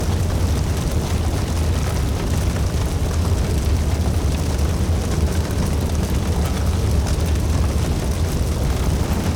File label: fire burn, campfire